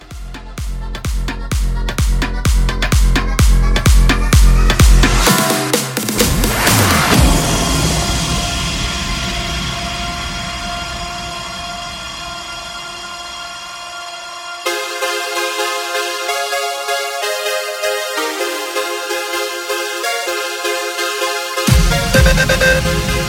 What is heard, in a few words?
Festival Hybrid Bootleg 128